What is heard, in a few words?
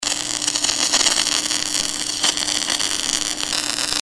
spray transfer, welding